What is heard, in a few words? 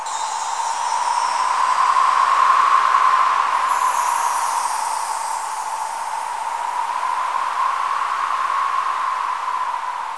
winter veter xmas; cstrike